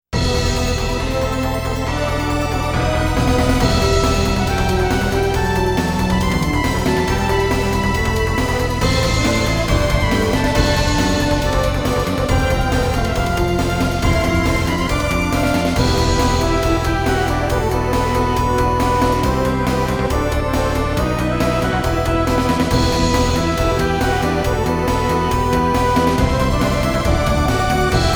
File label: Turn over a new leaf (rythmn version); Valkyrie Profile Lenneth